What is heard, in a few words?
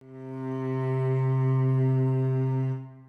cello